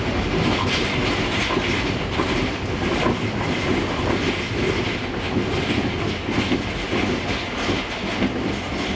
spring, Train Sounds; OpenBVE; 2022 HQ R110B

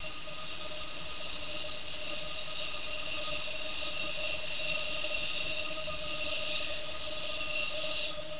flange, Train; OpenBVE

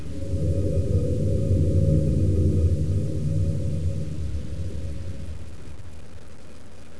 Brake, Train; OpenBVE

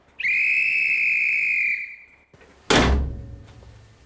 leave, CLASS 47, Train; OpenBVE

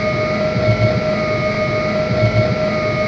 Motor, Train; H-Start 415 Stadler Flirt

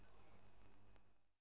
Air Zero; OpenBVE

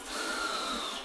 Air High, Train; Taurus; OpenBVE

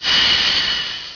Air, Train; OpenBVE; Expreso CIWL Fleche DOr

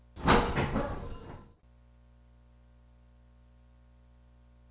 Motor 2, train; nankai6000; OpenBVE

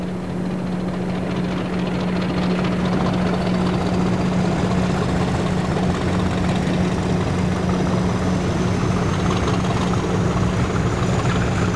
run up; Y8420 ter; OpenBVE

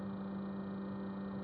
Loop, Train; OpenBVE

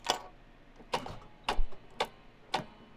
CM on, Train; OpenBVE

